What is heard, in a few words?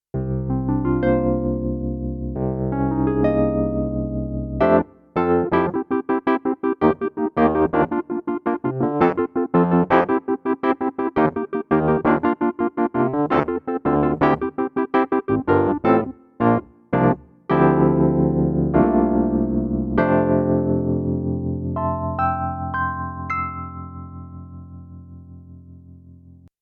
stagepianos kawai wurlitzer